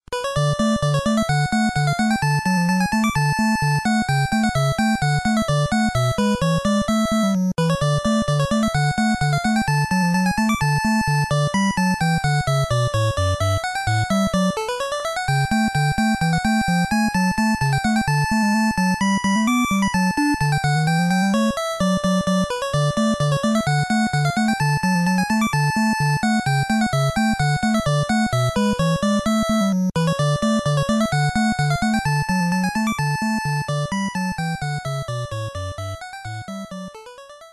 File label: game Bg Music